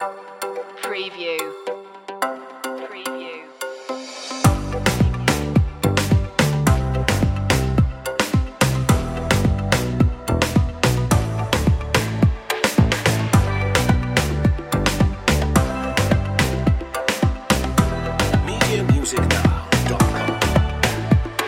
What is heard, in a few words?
tropical fun